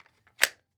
Weapon Gun Pistol 9mm Glock Clip Insertion; AdobeCC

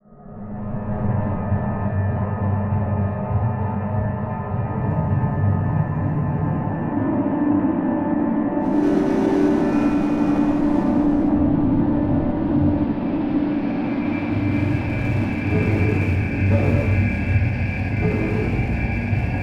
Slow Swells; Module Production Element Title Imaging; Imaging Elements

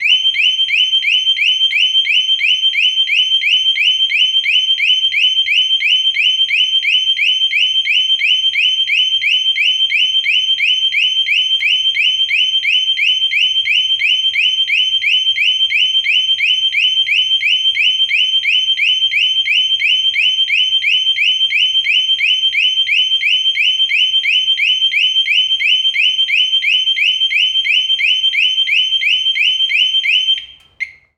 Motorcycle Harley Davidson Alarm; transportation

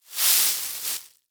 Hay Straw Movement Pushed; foley

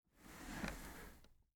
Chair Rolling On Carpet; household

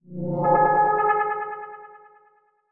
Transition Delay Medium

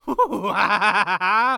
Cartoon Human Male Evil Laugh

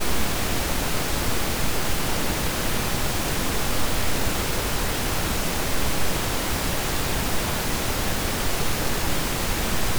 pink noise

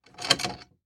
Foley Padlock Clink Single Clink

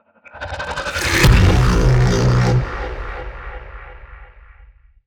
HITS, Cinematic Sound Effects

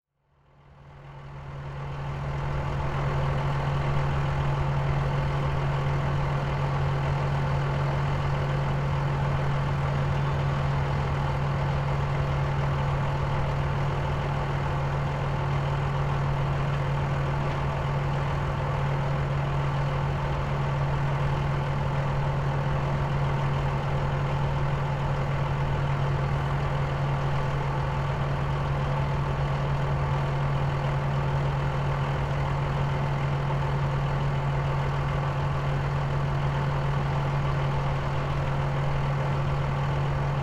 Transportation Bulldozer Idling

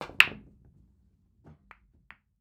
Sports Pool Balls Hitting Balls

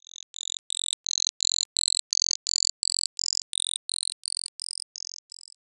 Sci-Fi Electronic Electric Pulse; Science Fiction

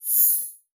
Multimedia Internet CD-Rom Flash Short

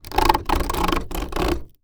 Foley Wood Scrape Across Cement Floor

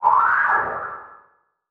Transition Whoosh Alarm

